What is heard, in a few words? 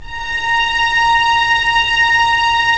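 STR SC, string section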